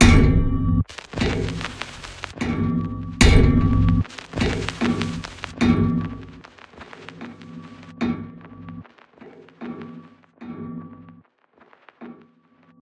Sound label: Percussive metal noise Tension Loop 75 bpm; MatiasMacSD; Affright